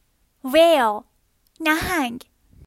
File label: Whale